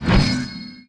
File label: Repair